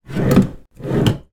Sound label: household small wooden unit drawer open close